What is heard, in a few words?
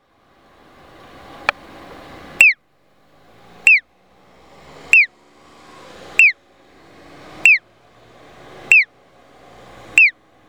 piyo; koito MP-I-2; okayama minami fukuhamanishimachi; onkyo kosaten